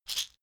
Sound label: shaker wood hit double soft